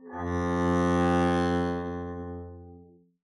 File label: arco f2 f up, double bass